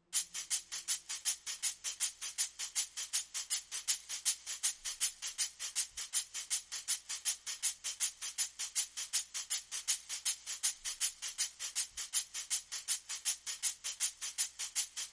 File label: Percussion